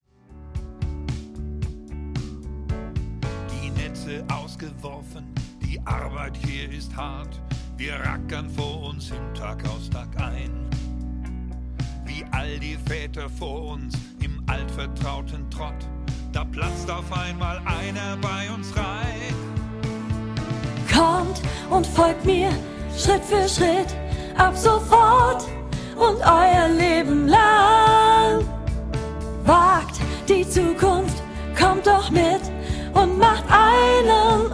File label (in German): Lern Sopran